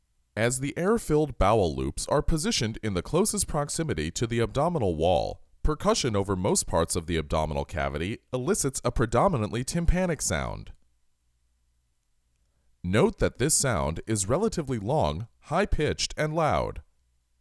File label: Percussion; Ab Percussion